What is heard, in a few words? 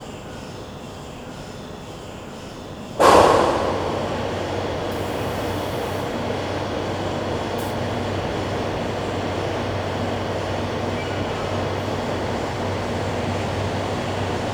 UT2 opening, Paranal; soundscape